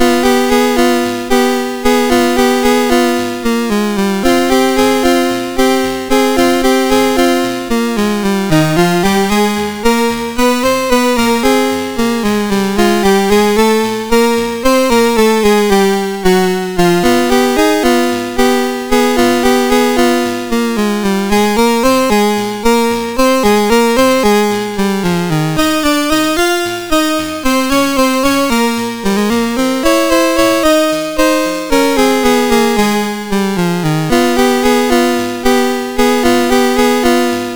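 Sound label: MOS 6581 SID chip; MOS6581R3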